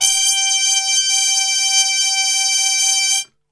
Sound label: G 3, Brass; Best Service; Brass Super Section; TRP HARMUTE; 90 s Sample CDs